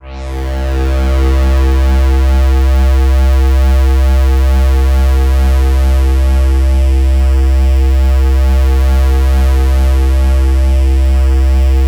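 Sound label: PAD C2 left, Jungle; USB Soundscan vol.05 - Explosive Jungle; AKAI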